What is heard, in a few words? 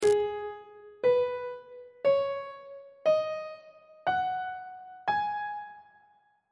g sharp minor pentatonic scale on treble clef